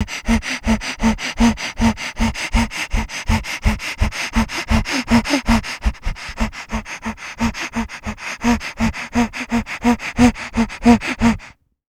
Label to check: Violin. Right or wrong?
Wrong